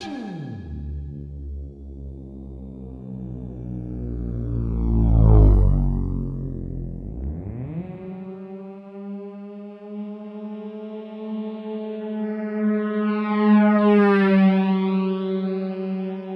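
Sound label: FLIGHT, SYNTHETIC; AKAI Anime Sound FX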